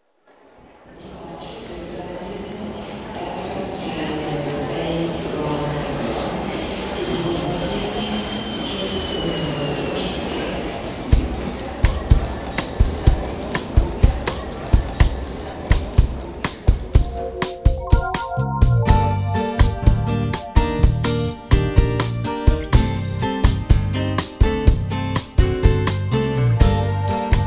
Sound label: Bossanova